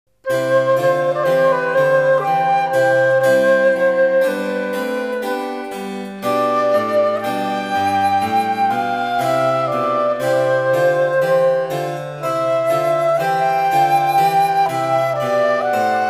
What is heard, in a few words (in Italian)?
flauto largo; locatelli